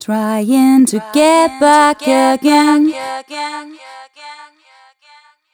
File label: f 140 mel b-flat, fem Vocals